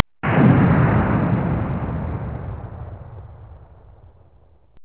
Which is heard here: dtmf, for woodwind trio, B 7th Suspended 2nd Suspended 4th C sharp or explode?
explode